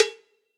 Cowbells; Drums; Drum Hits; Old School; Ableton; Session Drums Studio; MPE Hits

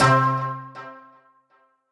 String Detune, Guitar & Plucked; Ableton; Factory Packs; Drive and Glow

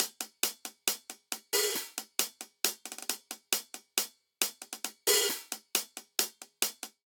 Motorik Intro 136 bpm, Drum; 4 4; MIDI Clips; Ableton; Factory Packs; Drum Booth

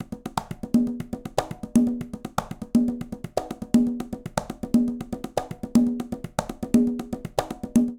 Merengue Conga Tumba 120bpm; Latin Percussion; Ableton; Factory Packs

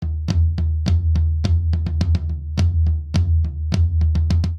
Country Toms Only 209 bpm; 4 4; MIDI Clips; Ableton; Factory Packs; Drum Booth

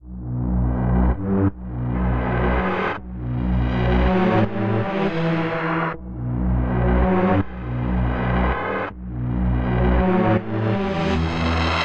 Tense Pattern - Bmin 81 bpm; MIDI Clips; Tonal; Atmospheric; Ableton; Factory Packs; Mood Reel

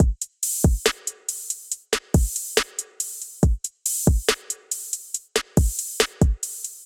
Jaded Kit 70 bpm, Trap Drums; MPE Jaded Kit; Trap Drums by Sound Oracle; Ableton; Factory Packs

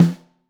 Top, Snare

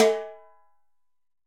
Timbales C H Rim; Latin Percussion; Drum Hits; Ableton; Factory Packs

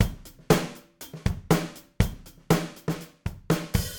Damped Snare, Recording Hybrid Kit; Ableton; Factory Packs; Drumdrops

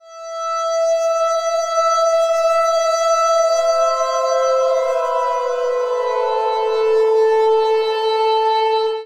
Dwarf Planet Pad Am 106 Bpm; MIDI Clips; Music; Ableton; Factory Packs; Vibrations; ModeAudio

